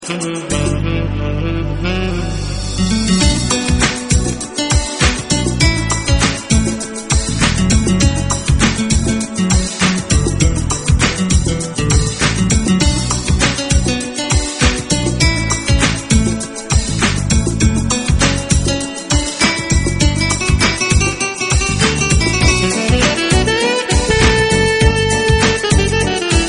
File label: sax